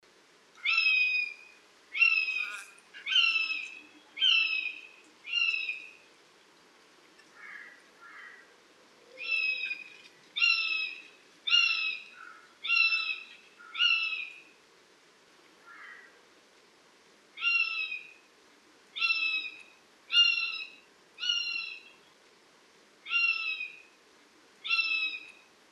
Poiana giovani; valsolda

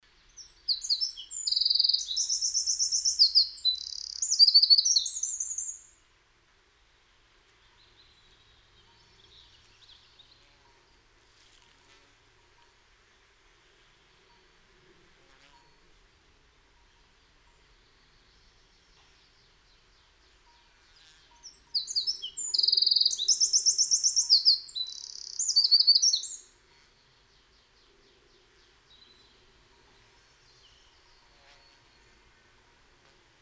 Scricciolo; valsolda

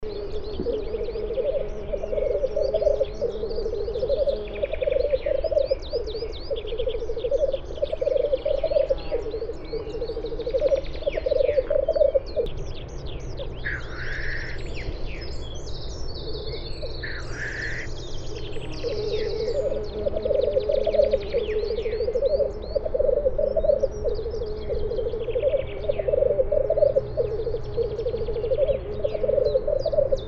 Gallo forcello rugolio; valsolda

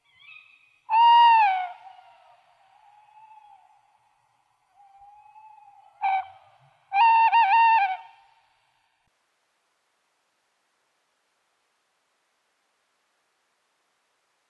Allocco; valsolda

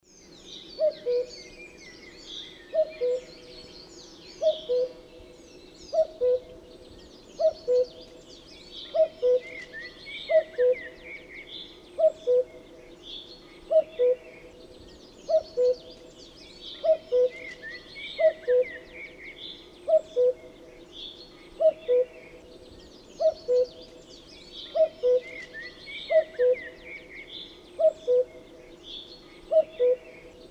Cuculo; valsolda

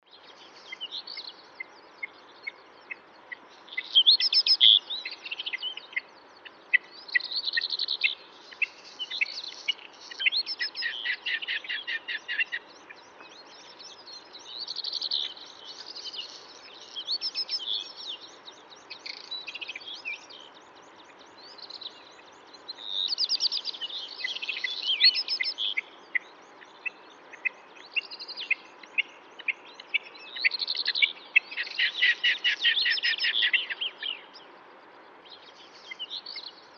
Coturnice; valsolda

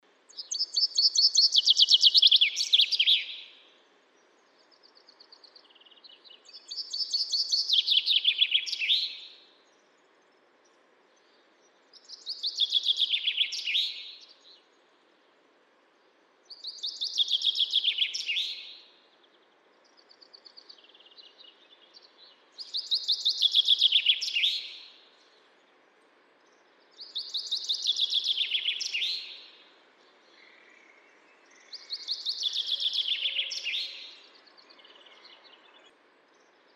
Fringuello; valsolda

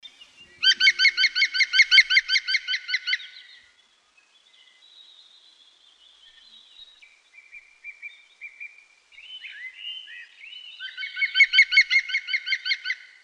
Picchio verde; valsolda

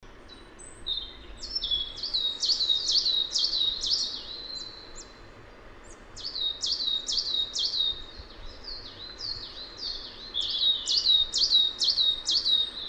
Cincia mora; valsolda

